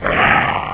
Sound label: zombie die